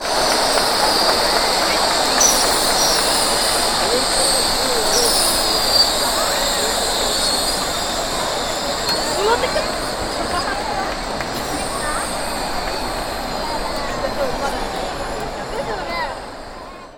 balloon up

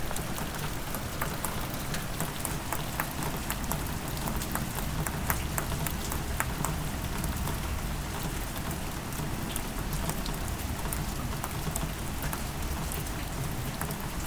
hearing rain

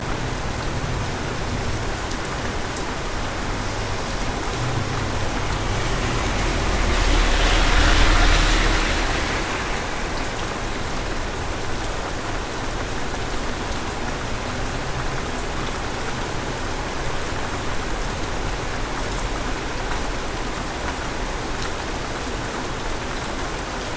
car and rain

